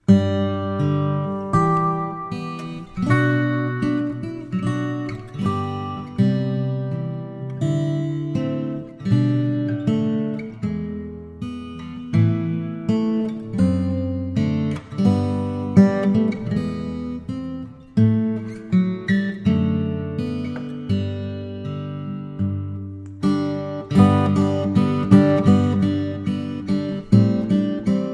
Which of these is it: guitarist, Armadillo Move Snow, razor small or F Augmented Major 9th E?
guitarist